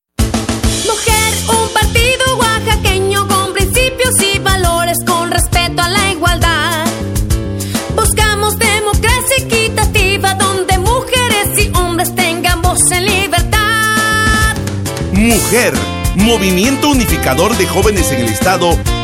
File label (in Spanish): Jingle; Partido Mujer 30 UNO